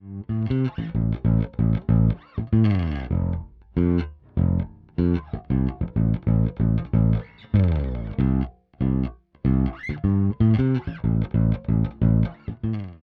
Zoom B2 Four DI Plus Preamp

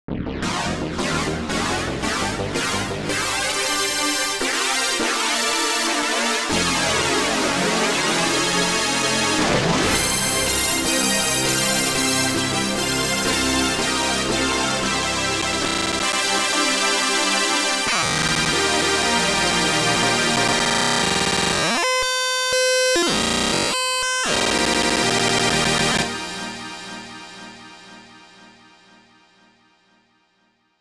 Yamaha Montage M Super Glider

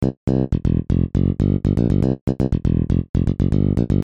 finger bass

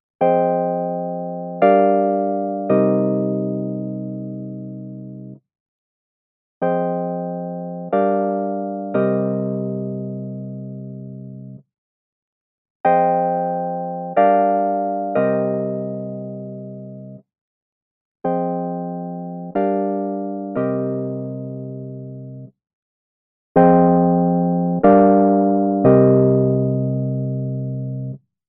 Nord Stage 3 Amp Types LP24 HP24

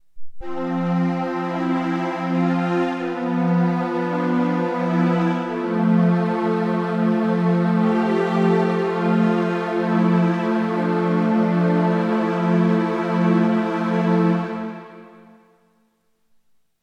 Strings

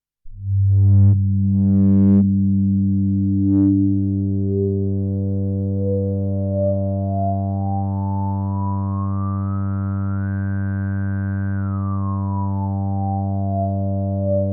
Drone with Filter and Reso; King Drone 6

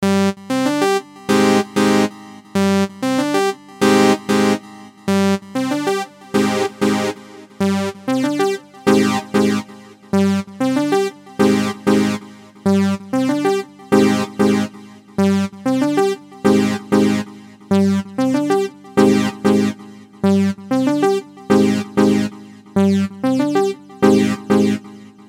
Unison

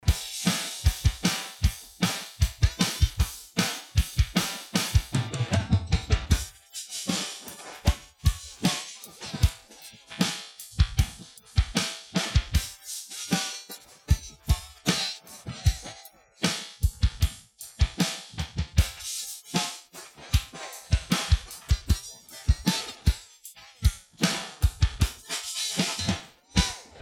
Magix Acid Pro 10 Stem Drums

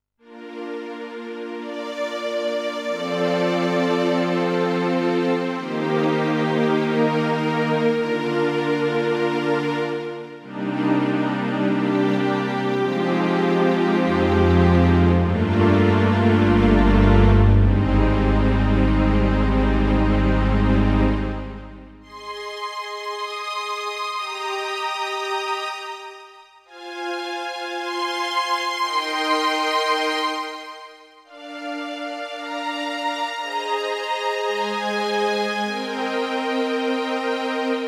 Roland FP 10 Strings

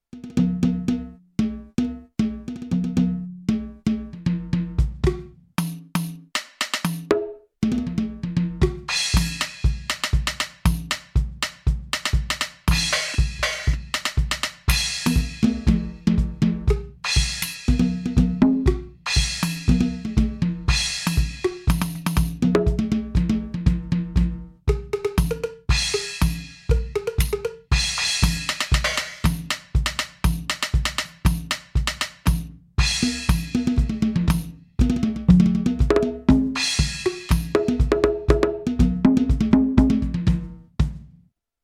Millenium MPS 750 Latin Kit

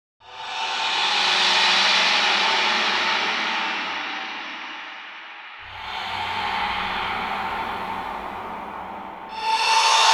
Cymbalscape Scrape

